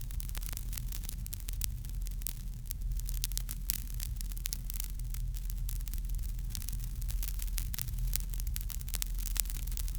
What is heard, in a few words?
produce an ambient white, popping